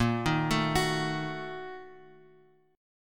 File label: Bb-Major 7th Suspended 4th Sharp 5th, Bass Standard; arpeggiated; JGuitar